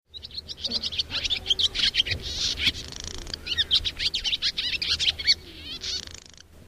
rauchschwalbe; Hirundo rustica; Schwalben

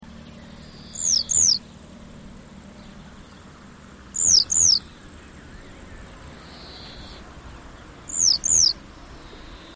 schafstelze

